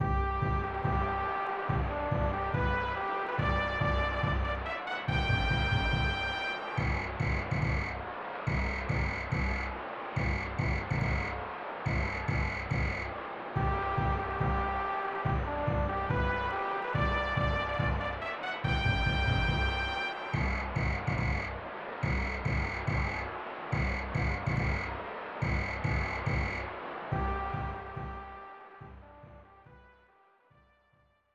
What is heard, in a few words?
Baseball